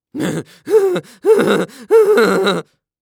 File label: Cartoon Human Male Laugh Nervously Excited; AdobeCC